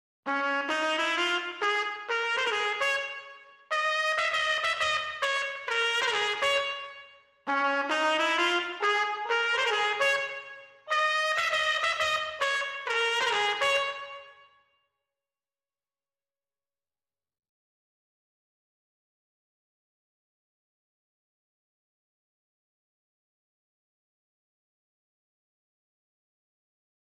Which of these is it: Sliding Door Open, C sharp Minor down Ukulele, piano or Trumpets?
Trumpets